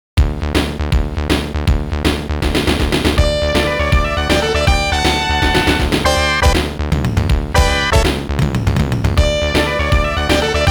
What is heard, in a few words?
All Clear; Darius 30th Anniversary Box